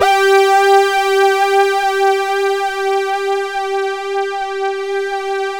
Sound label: SYNTH PAD; Sound Gallery - Charged; SYNTH PAD 1